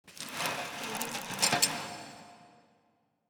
creepy horror metallic iron chain drag

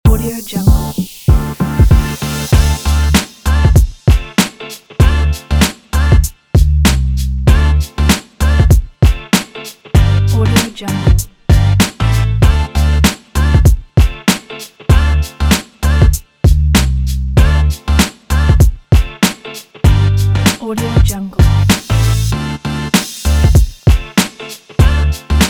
funky positive

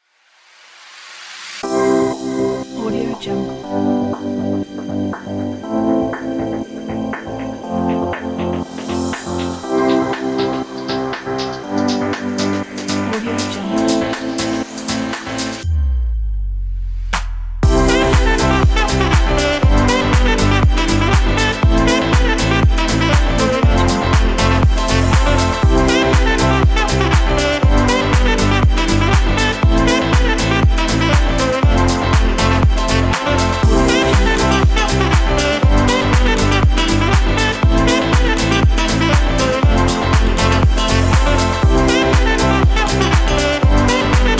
party

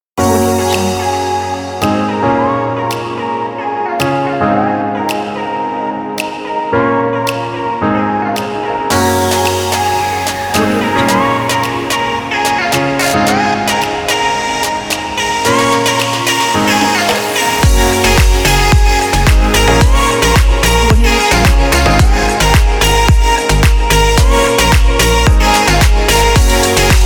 reggaeton

